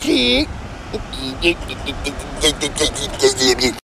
beach stutter

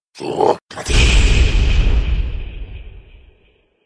frost shout; draugr

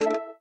android 4 4 camera sound

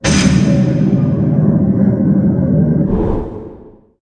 mage armour; wow

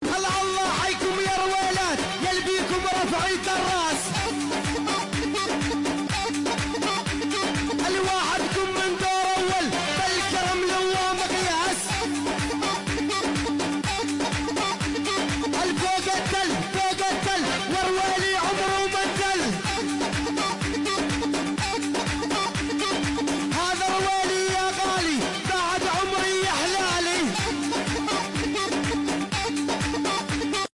arabic song

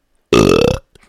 realistic burp

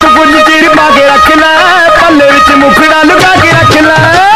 indian meme music end; unused part

